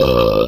burp short

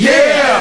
"yeah" crowd; ooh ahh

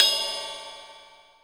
Cymbals

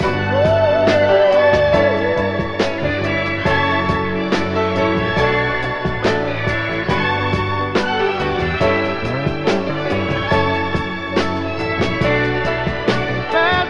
full, Loops Phonky

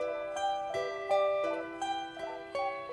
guitar, Jazzmatazz loops

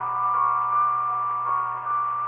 keys

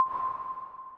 bloop; Zaps and Beeps

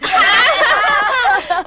laughter kids; ooh ahh

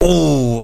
"ooh" male

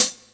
Hats, Real Closed

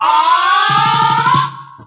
"ah", DRUMROLL

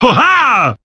"huh ha"; ooh ahh; heh huh

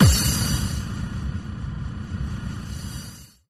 electric explosion; Crash Bang Boom

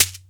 Shaker

